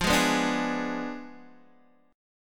F Diminished 7th Guitar Open D, chord

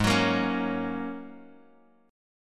Ab 9th Suspended 4th Ab down Bass Standard; Ab9sus4 strummed; JGuitar

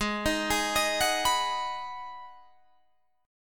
G sharp Minor 7th G sharp, Guitar Open D

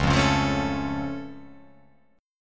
C sharp Augmented down, guitar chord

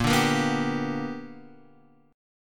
B Minor Major 7th Sharp 5th, chord

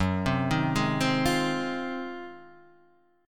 Ab 13th Gb

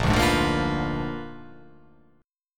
A sharp 7th Flat 9th A sharp down

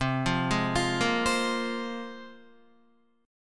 C 7th Suspended 4th Sharp 5th, Guitar; C7sus4#5 arpeggiated; JGuitar

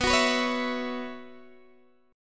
B Suspended 2nd Suspended 4th, Guitar, DADGAD

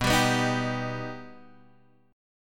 B Augmented B Guitar Half Step Down, chord

